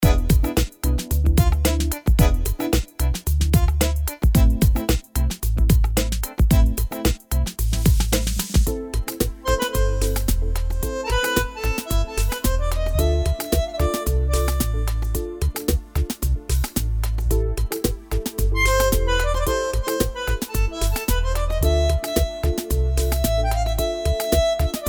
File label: Tango Nuevo; AiR Symphony